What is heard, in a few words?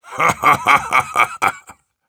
Heavy laugh evil, ru; зловещий смех; Насмешки пулемётчика